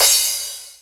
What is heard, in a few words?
Crash, E-MU Proteus-2000